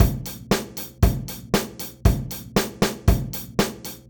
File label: 117bpm; GroovePizza